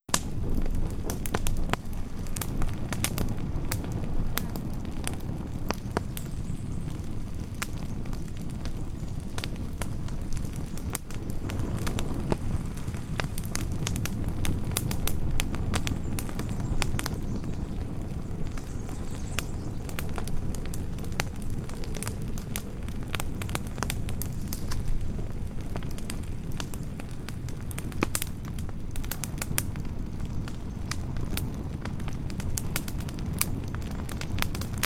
Fire Ambience

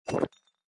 potion consume; hygrounds

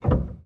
piano release; samples-piano

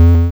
Boop

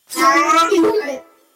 bb8 up down

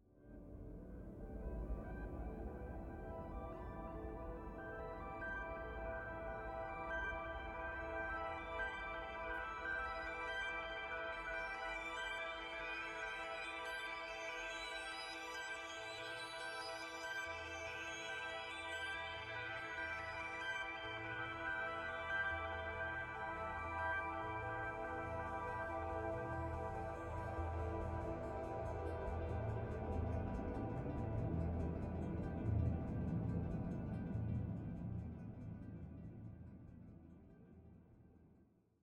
funky chirps; vignette; craft

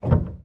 piano release; samples-piano

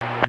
Radio Click; AIRBOSS

